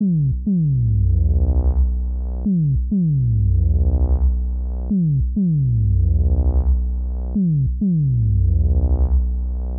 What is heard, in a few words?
Bass A, 098 Bmin; Hip Hop Exotica; Apple Loops; Big Fish Audio